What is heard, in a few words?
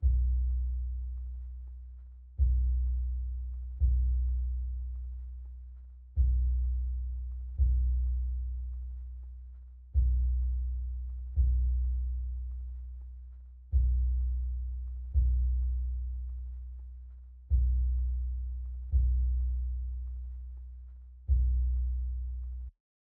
127 BPM B Min Bass; Trap Melody Loops; Loop Stems; Cymatics; Spirits